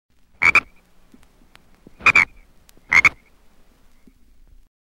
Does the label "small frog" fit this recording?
Yes